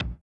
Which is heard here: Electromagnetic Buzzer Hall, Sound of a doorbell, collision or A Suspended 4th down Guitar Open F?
collision